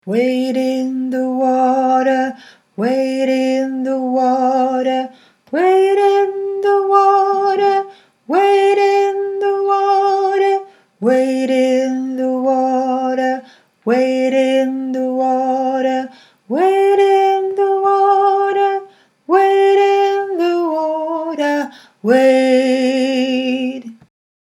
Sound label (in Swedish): stämma